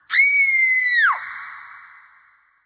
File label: kiwi